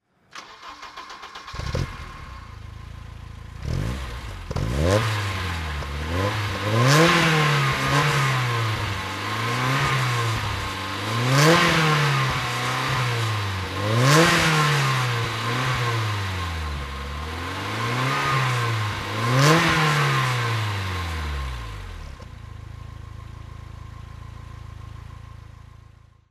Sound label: BMW E30 M3 throttle